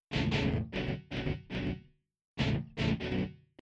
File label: E. Guitar